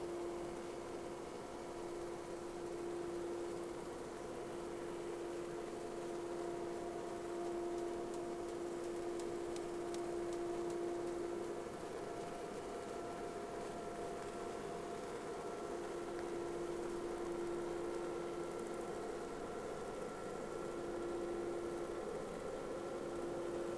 Whales; SeaSnake

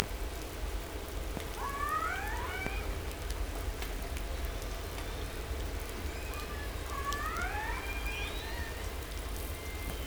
hydrophones, lime kiln

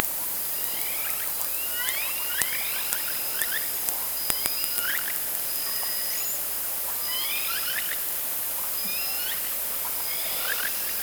Orca sound Night Passby

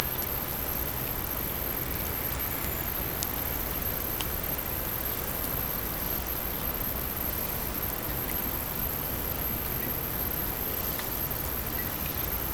Hydrophones, orcasound

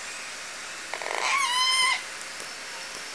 call uk, L pod; possS40 L pod

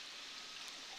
Background Noise Clips

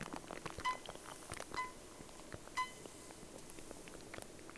sound, Boat; SeaSnake

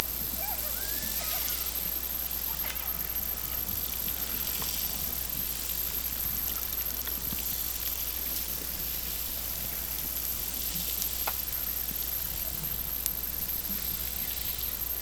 Hydrophones, orcasound

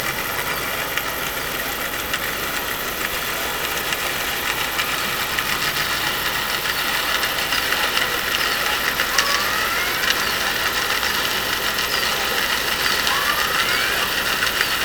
Hydrophones, orcasound

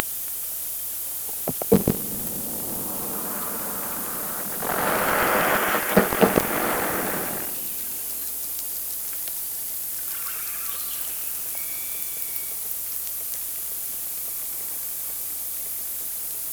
Orca sound Night Passby

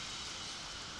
Background Noise

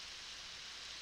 Background Noise

